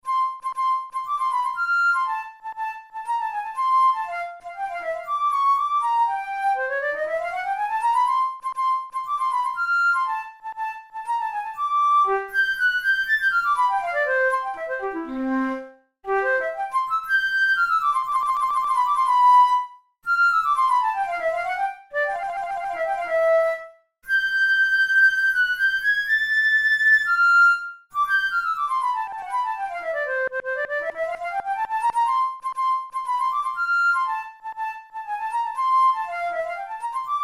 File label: studies for the boehm flute; drouet 72